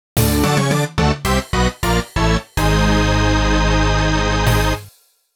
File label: 5th-8th Place Fanfare; Mario Kart 7